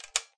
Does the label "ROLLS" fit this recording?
No